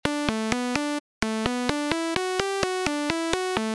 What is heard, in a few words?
attack dist